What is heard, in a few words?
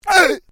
MALE VOCALIZATION ARGH